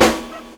SD KILL, snares; E-Lab; X Static Goldmine 2; akai

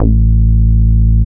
bass

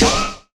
SNARE; E-Lab; X Static Goldmine 2; akai

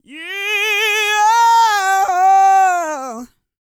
GOSPEL

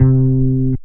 BAS TON; E-Lab; X Static Goldmine 2; akai

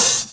OH STOP; E-Lab; X Static Goldmine 2; akai

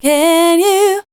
voice spectral, ly short

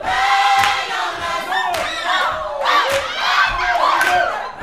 YOUTH CH PHRASE, Vocal Planet Gospel

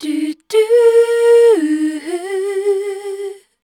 Voice Spectral MELODIC #D

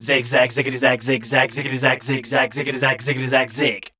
SCAT C, Voice

